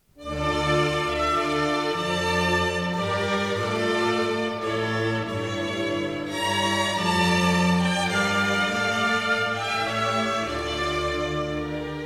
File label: Organ Concerto; Handel; Op4-5; HWV293